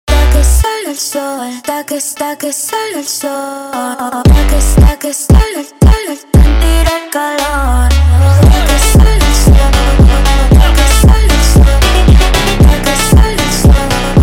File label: Montagem slowed